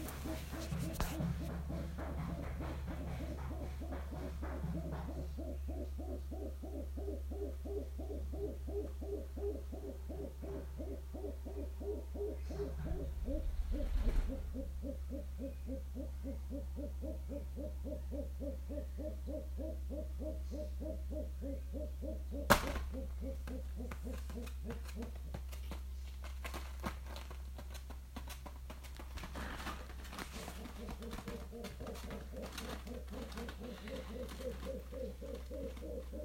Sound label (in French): Springbok